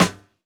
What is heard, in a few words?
Snares